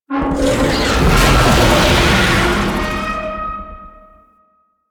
Tank Infested Vocal Attack; Lasrian Tank

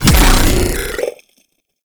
Grendel Deluxe Devour Regurgitate Impact; Warframe

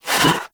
Pet Alert Snort; Warframe; Catbrow; PetShip

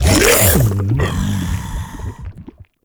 Devour Impact B; Warframe; Grendel

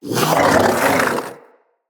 Vocal Alerted, Hunting Critters; Characters; Predasite

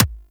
Weapon Punch Pistol; Warframe; Weapons; Pistols

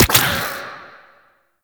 Venom Burst; Warframe; Saryn

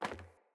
Sandals Run Metal, Footsteps; Player

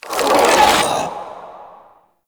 Void Rot Cast; Warframe; Broken Frame

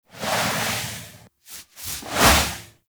Kavat Foley Pet; animals; Warframe; Duviri

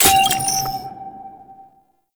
Link Activate; Sentient; Squad Link

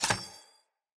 Bow Charged Shot Ready; Weapons; Player; Bows; DendritBow

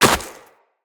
Run Snow, Footsteps; Operator

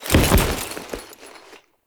Foley Land; Warframe; Kahl

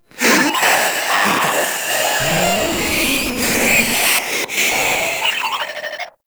Proto Infested Runner Idle; Warframe

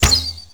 Quick Fire Shot, bow; Daikyu Prime; Warframe; Weapons; Tenno

